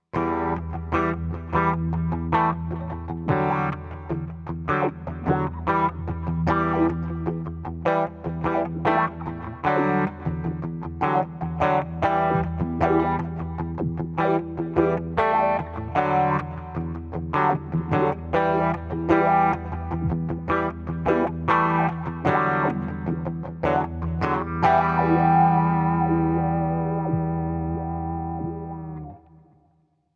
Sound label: Artemis Multi Frequency Filter; Spaceman